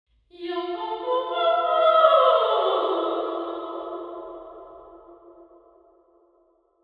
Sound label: omni sing, nuclear reactor hall